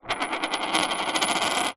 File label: money coin spin on wooden floor; foley; zapsplat